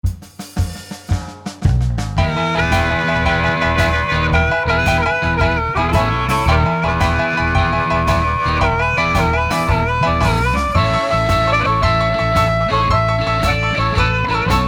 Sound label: Blues